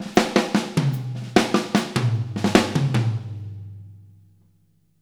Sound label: Fills 75; Zero-G; Monster Beats